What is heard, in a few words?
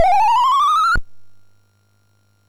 sid MOS6581R4